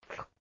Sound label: Suck; Lewd SFX